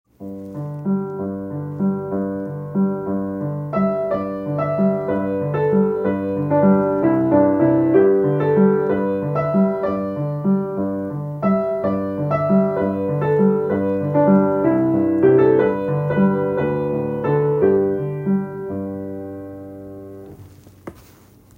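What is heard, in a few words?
The banks of Newfoundland, Piano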